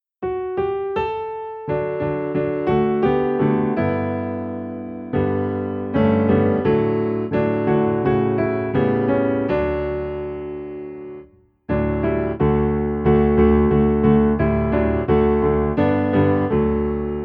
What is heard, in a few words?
Piano Low in D